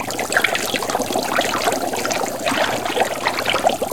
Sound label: Fluid Mouvement Loop; Puddle; puddle plus ios gamerip 2018